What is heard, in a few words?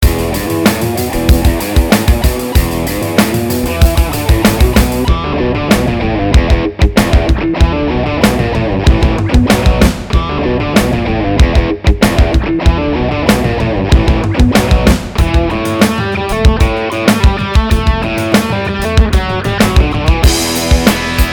classic rock; songkits; Mixcraft